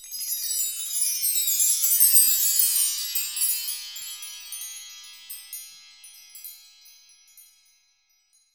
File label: WINDCHIME; ILIO; Synclavier Percussion 2; World & Orchestral